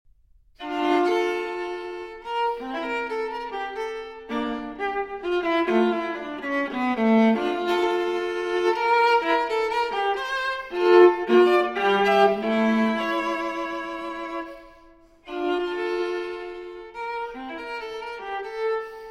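Cello Suite No. 2 in D Minor Transcription For Viola Menuet I-II; J.S. Bach; BWV 1008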